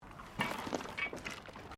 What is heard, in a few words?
JUNK, Debris; Material components for constructing more complex sounds; Materials